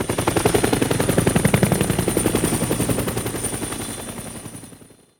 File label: Helicopter Spawn; Troll Helicopter SFX Spawn 01